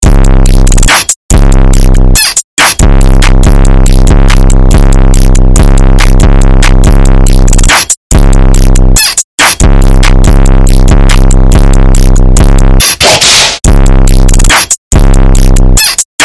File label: bed squeak type beat